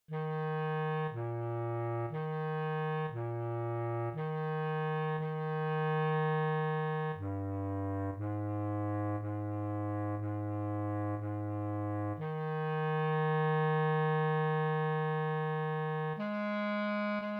bass clarinet chorale and recessional

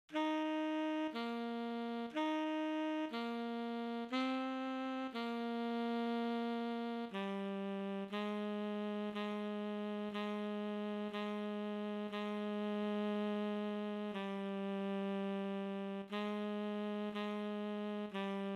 tenor sax chorale and recessional

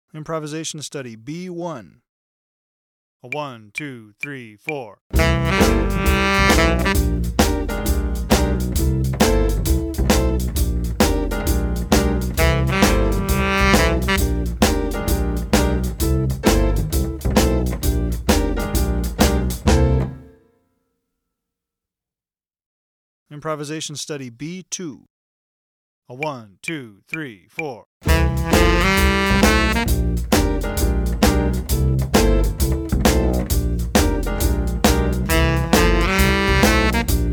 shuffle improvisation

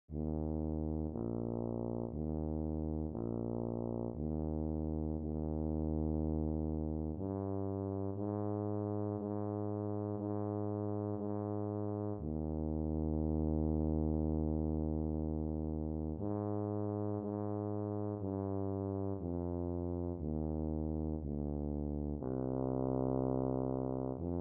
tuba

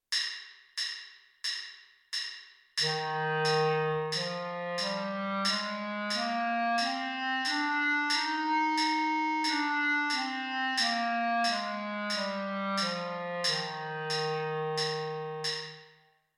f major scale clarinet

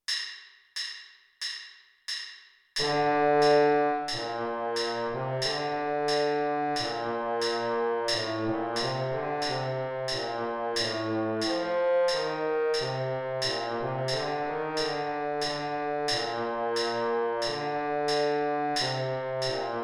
the conquering hero trombone baritone part b

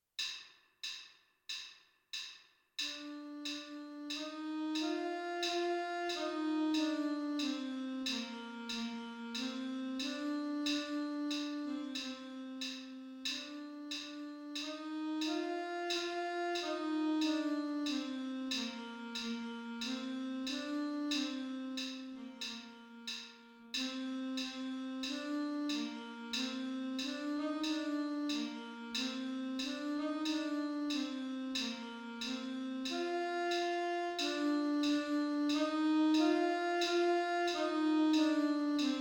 alto sax

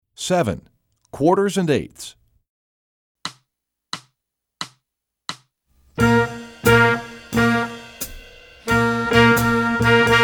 quarters 8ths

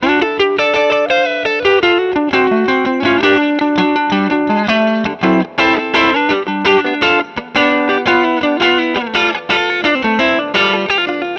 electric guitar 20th century pop

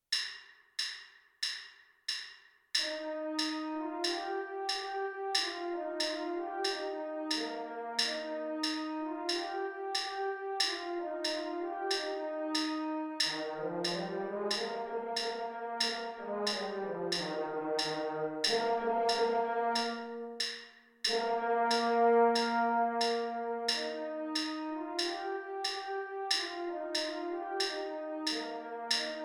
alouette french horn

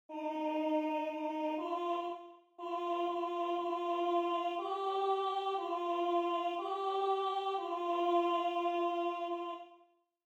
alto

